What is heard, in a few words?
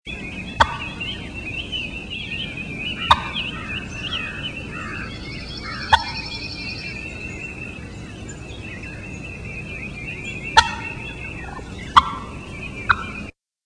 Putt, turkey; wildlife